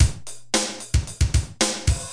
machine; SGI O2 Out of Box Experience; jungle Island; Cyber Gourds

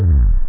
ROTATE; outwords

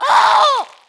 frost alf female hit; DAoC

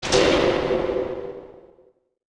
tomb switch; Hellboy

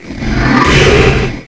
thunder cannon roar; CD Action 18b 1997; netstorm

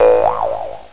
drop

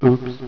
miss letter; HangMan Plus v3.0; Word

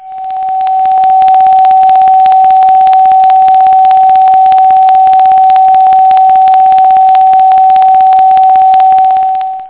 one kHz; da capo vol1; effects whoosh

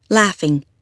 laughin

